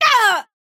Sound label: pain big